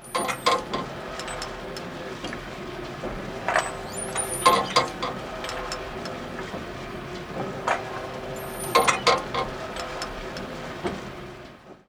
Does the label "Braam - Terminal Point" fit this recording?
No